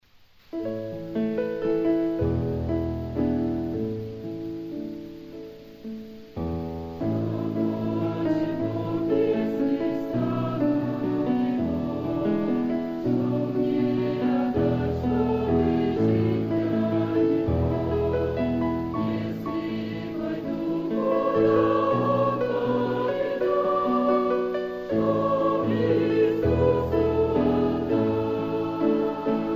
penie; Krasnodar youth camp

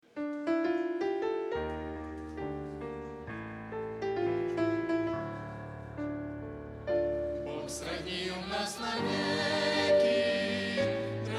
пение; Youth Camp (Camp Paradise, CA)

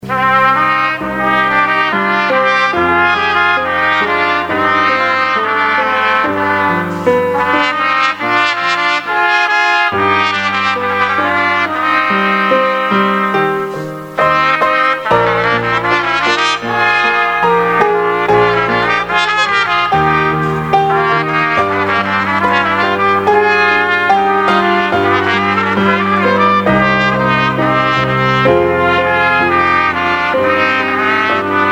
игра на трубах; Курск, молодёжный лагерь

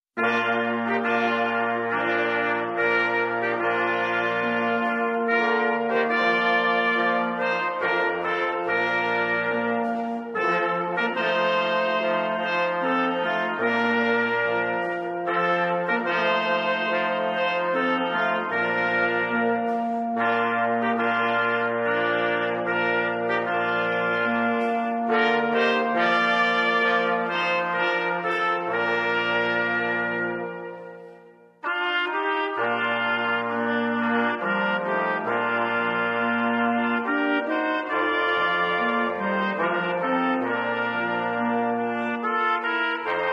музыка; Благодатная скала

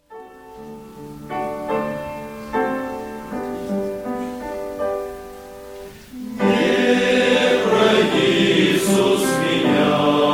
Penie; Семинар рук. молодёжи, США

